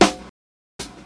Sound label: damped acoustic snare sound f key, steel snare drum sample